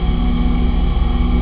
ENG ROOM

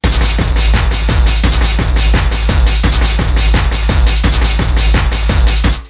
HARD LOP, DRUMLOOP

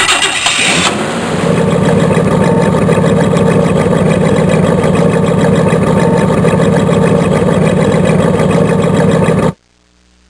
Olds Starting then Idling; 82 Olds; Vehicles

